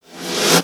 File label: 80s Snare Rev; Drums